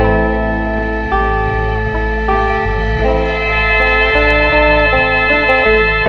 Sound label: guitar and keys, Phonky Loops